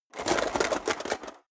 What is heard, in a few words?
metal box small shake rattle short; soundpool